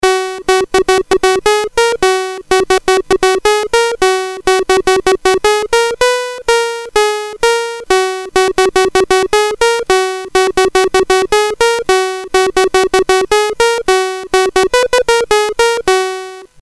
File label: Music BASIC, CSG8580R5